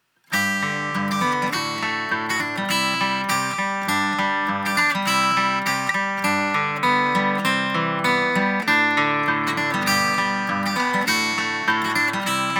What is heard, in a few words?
acoustic guitar; 1073 EQ; slate ik